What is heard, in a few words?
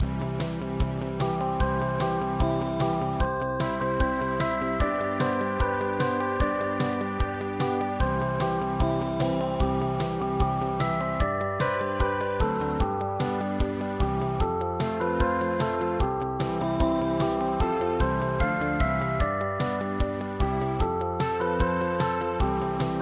ballade; ADVANCE AUSTRALIA FAIR